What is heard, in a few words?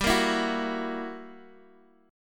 F sharp 7th G, chord; strummed; JGuitar